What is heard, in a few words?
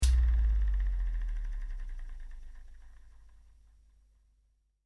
Fan: Small Desk Fan Switched Off; Electronics; Fan Sound Effects; Fans (Sound Effects:Electronics); Stuart Duffield